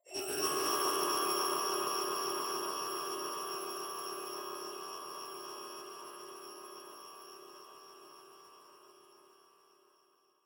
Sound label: notification enchantment; signale